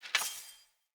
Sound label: Hunter Bow Ranged Charged Shot Ready; Weapons; Player; Bows; EnvoyHunterBowRangedMelee